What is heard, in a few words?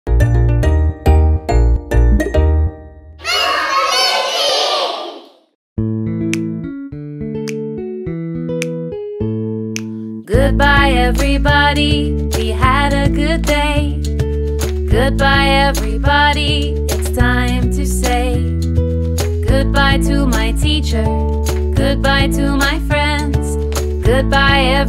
goodbye song for children, stretch song for kids, English greeting song